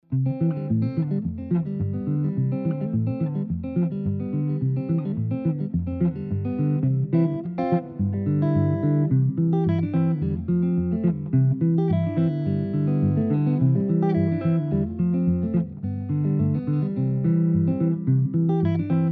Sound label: Guitar; travis style tunes